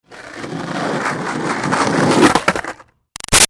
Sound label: Skateboard Up Curb Hit Crash; Roll Up; Skateboarding; Sound Effects:Sports; Big Room Sound